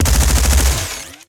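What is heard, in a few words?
gun auto sci fi shotgun burst, sci weapons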